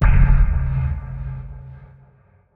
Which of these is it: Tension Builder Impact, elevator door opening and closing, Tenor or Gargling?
Tension Builder Impact